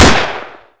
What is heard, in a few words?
aps fire; svencoop; paranoia; weapons